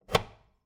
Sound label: Cabin, outer doors, double doors, tension, latches, wooden, screens, slide bolt, wide; Eiravaein Works; Vaeyan IV